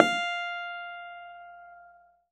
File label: Model-C BC F4; Keyboards; Ableton Foundation FreePacks